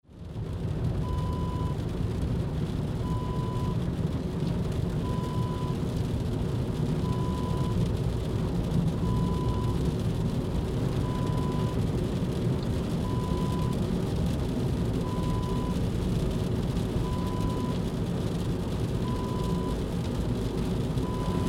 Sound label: car interior ambience rain